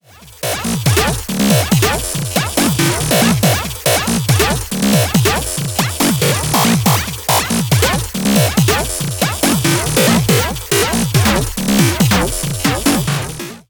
Triaz Nifty Tech Beat; Wave Alchemy

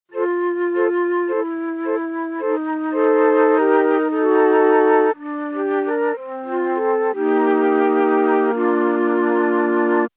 Mellotron M4000D MKII Flute

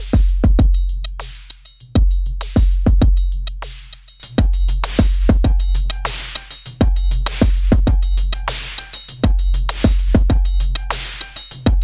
Elektron Analog Heat, Color Compressor

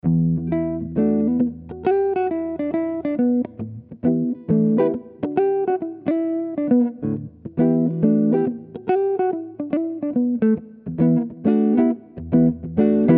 Neck PU clean tone cut; George Benson

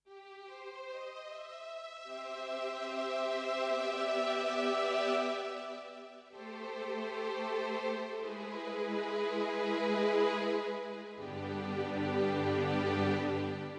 SP5100 Strings

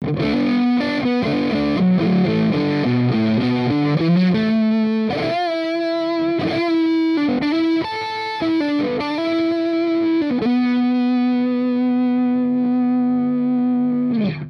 Middle SC Solo

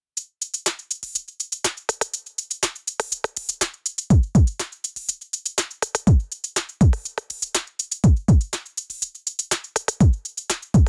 electro

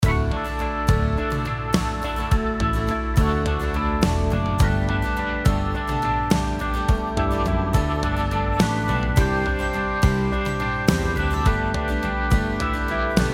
MixBusLite Rock Warm; 1806 LUFS

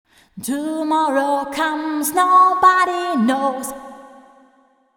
TC ClassicVerb Live Arena

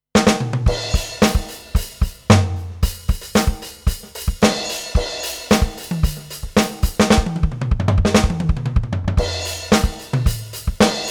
Alesis DM Dock Rock Kit

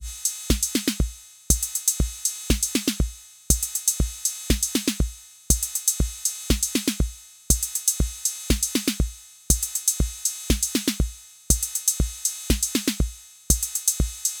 Roland TR-06 Boutique Beat

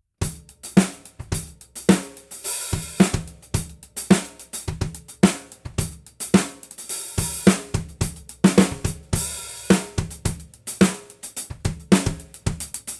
Groove

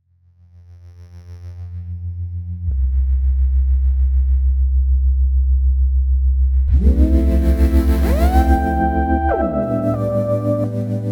Roland Gaia2 Lead

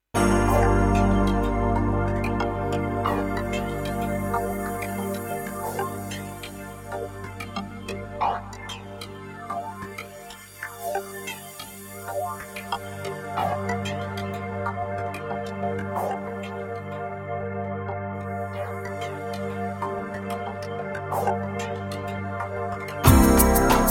Pianophonix

